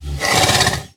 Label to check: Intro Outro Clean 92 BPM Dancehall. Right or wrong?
Wrong